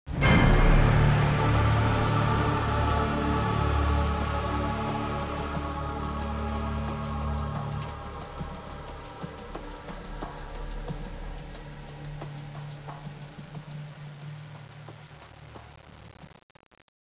lost round; musickits, CT; csgom; cstrike

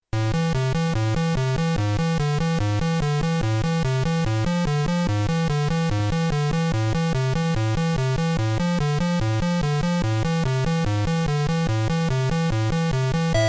tetris music; czero

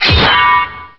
bonus armor; bonus platform

